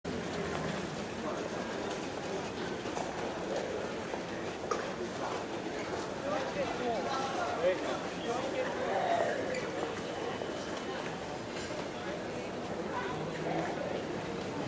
Transportation Interiors; Blastwave FX

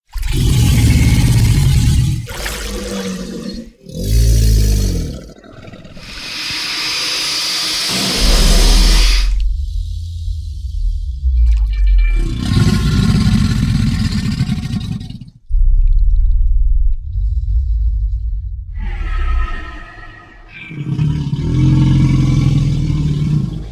Gators; Pro Sound Effects

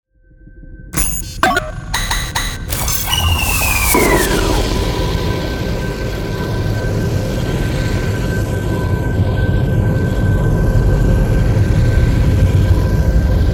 Interstellar Environments; Field and Foley

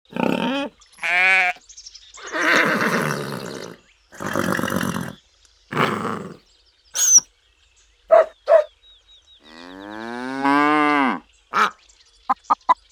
Farm Animal Sounds; GameDev Market

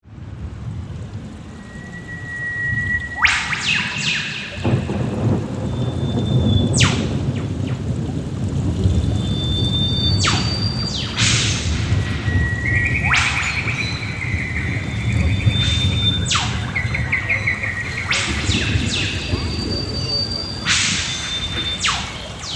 Kookaburra Bushland; Field and Foley

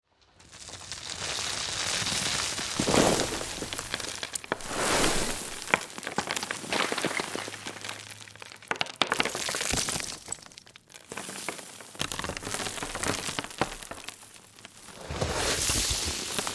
Dirt And Dust; Big Room Sound

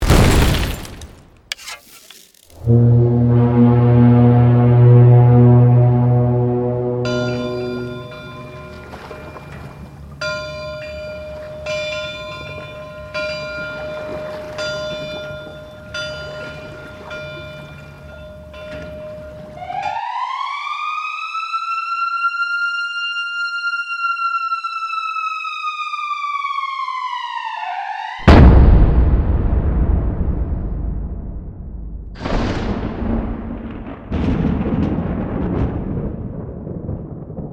Explosive Sounds; Field and Foley

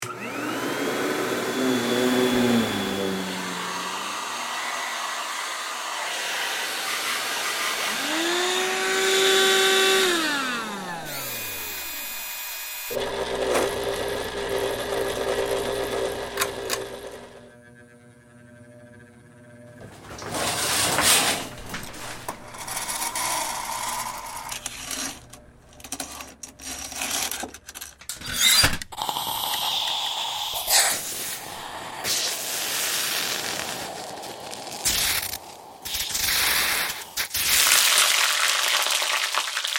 Household Sounds; Big Room Sound

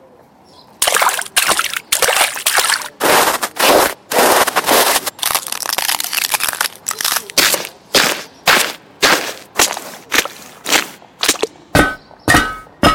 Footstep Sounds; Unreal Engine

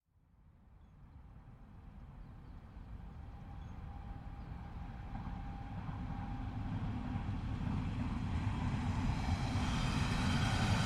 Berlin Ambiances; Loot Audio Hzandbits

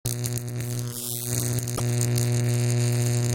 Electric Synth Sounds; Blastwave FX

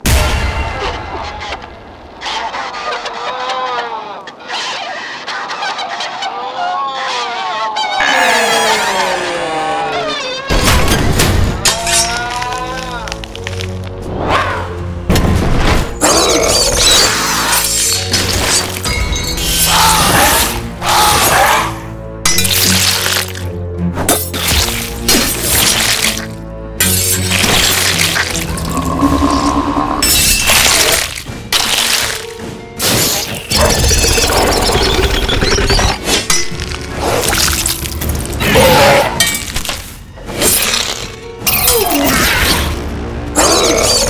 Horror; SFXtools; Horror Game

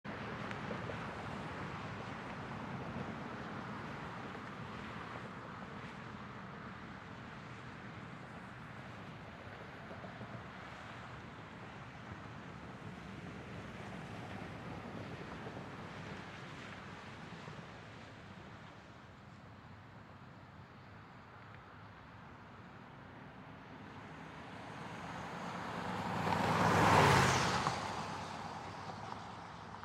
Traffic; Blastwave FX

